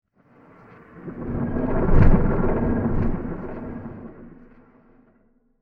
Scary Debris Transition; Scary Soundscapes; Horror; Crafted Signals

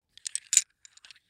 Domino Handle Move, Domino Handle Movement; Board Games; Sports

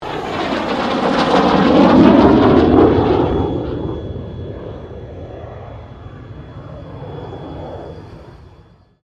AIRCRAFT CA-27 SABRE PASS STEREO

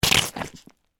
Suction Mud Fart, Texture, Suction, Mud, Fart; Water; Sound Effects:Nature; BlastWave

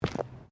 Footsteps Boots Plastic Ground Mat Step

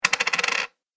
Coin Falls On Hard Surface Long; Money Sound Effects

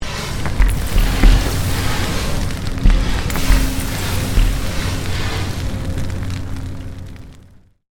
Created Magic Spell Meteor Shower; Series of created spell sounds

